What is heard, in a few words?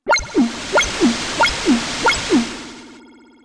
bowser poison gas; SNES; SMRPG